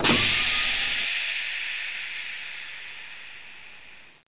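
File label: Sound Door close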